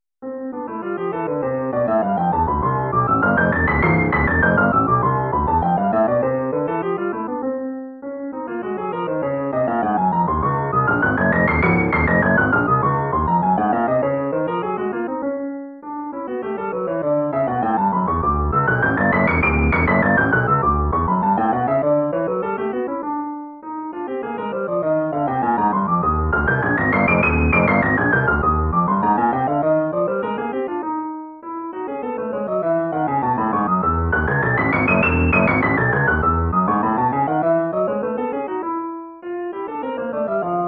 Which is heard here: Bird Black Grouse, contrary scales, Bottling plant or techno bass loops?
contrary scales